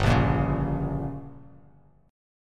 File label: G Diminished, Bass Standard; strummed; JGuitar